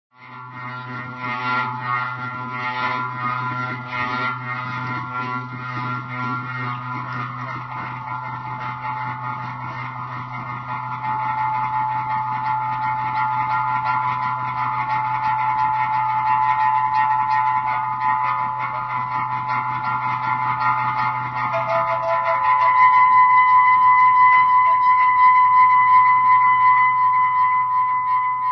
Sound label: percussion water bell fountain tabletop fountain; woodstock